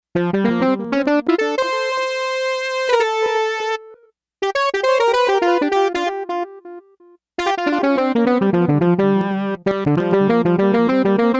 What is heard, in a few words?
Yamaha DX11 Sax Solo; Factory Performance Voices